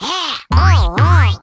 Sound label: China Miss Sound; Jolly Battle; Android